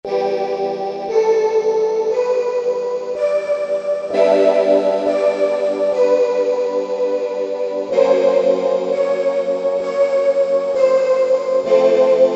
Numb Link, Roland V-Synth

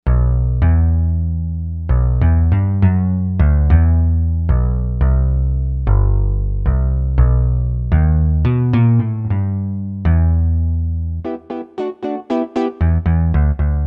Round Bass, Korg M1Rex; Factory Patches

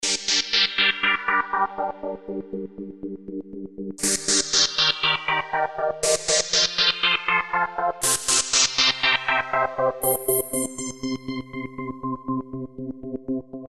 Pad Deep Space, Alesis Micron; Factory Programs

